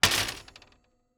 Cart Old Metal Cart Rattle Single; Foley

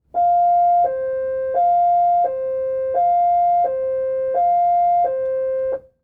Police Car Siren Interior; Auto; Transportation

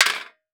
Impact Pan Tin Hit By Solid Metal Pipe

